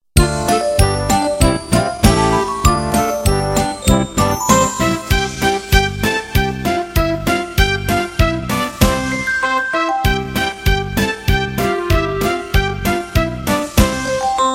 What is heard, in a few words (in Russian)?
Шумовой оркестр Бубенцы; музыкальный калейдоскоп